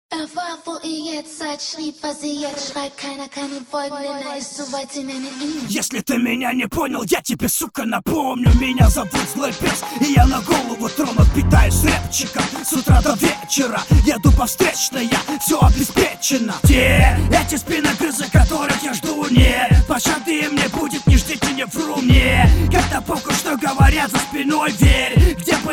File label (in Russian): Rap без правил